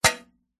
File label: vehicles bicycle pushbike metal mudguard hit impact clunk; zapsplat